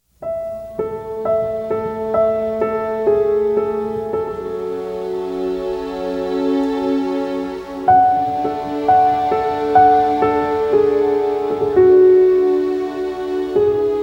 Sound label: Piano Theme; Couples Retreat (2009); Jason & Cynthia